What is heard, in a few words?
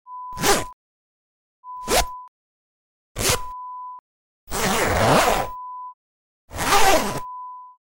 zip sounds